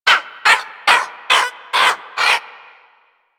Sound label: Bird Of Prey Vocal Idle Beg; Warframe